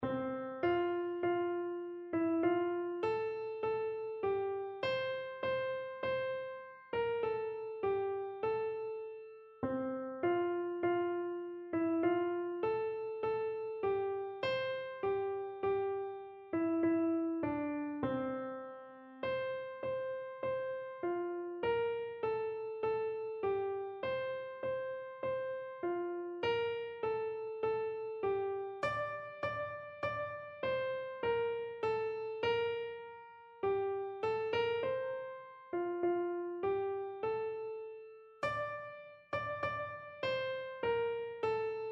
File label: hark, keyboard